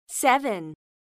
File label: Key Tone; Samsung GT-E1172